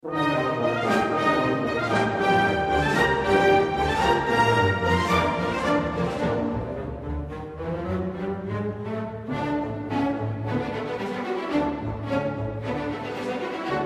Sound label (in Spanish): Musica del Romantico; honduras musical 8vo grado